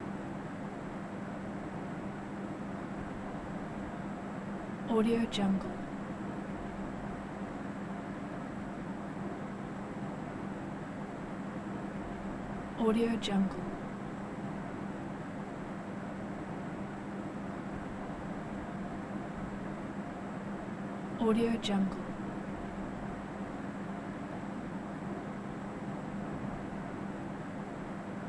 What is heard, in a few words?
overhead fan quiet room tone; moonaudioproduction